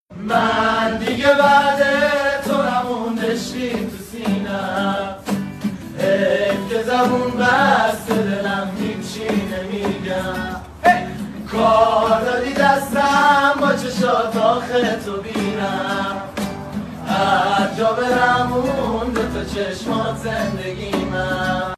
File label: Guitar